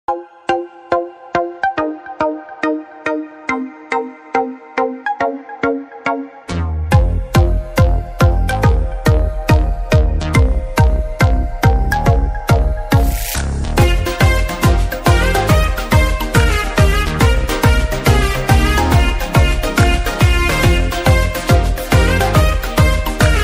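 Tamil Christian ringtones, Jesus ringtones, Christian background music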